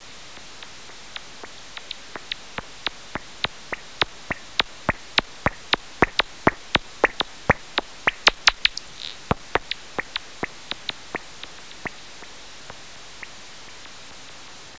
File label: Noctule, bats